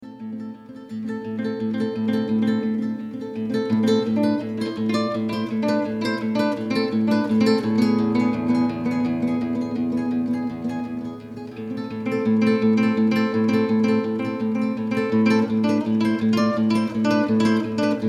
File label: Gypsy Flamenco; FLAMENCO INSTRUMENTAL